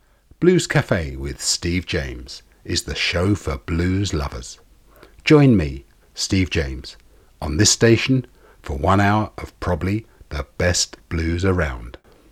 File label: Dry